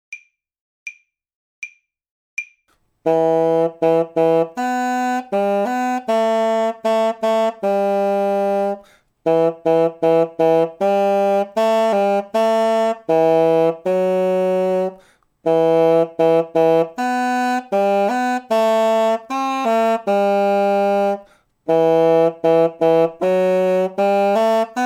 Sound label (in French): promenade, bassons volants duos